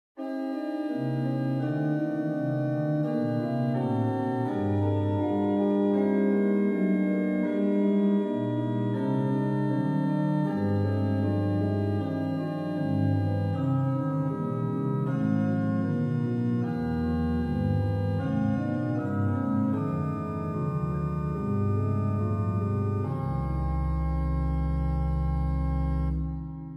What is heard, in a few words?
organ